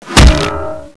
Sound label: pipe hit bod; weapons